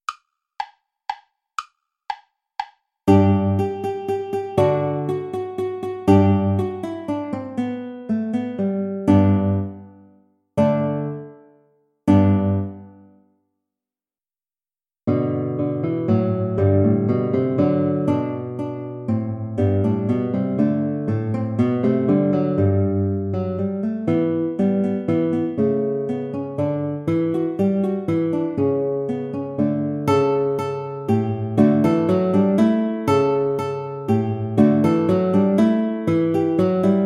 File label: old hungarian dance play along without guitar